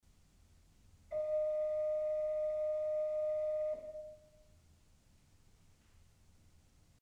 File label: Principal 4' DIS, orgel pipes